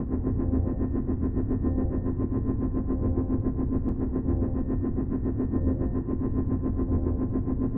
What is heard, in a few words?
beam loop; grunk